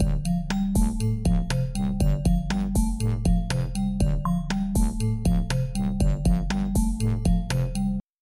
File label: Synth beat and bass; 当三条音轨一齐播放时，你可以听到打击乐合成器的低频能量与节奏、贝斯声部产生了冲突。